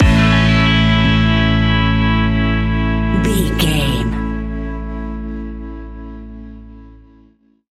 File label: Chill Out Whisper Dreams Stinger, A relaxed and chilling piece of music; great for light documentaries, background music for tv shows